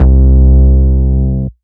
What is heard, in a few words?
Ultimate Bass Root C; Vengeance Samples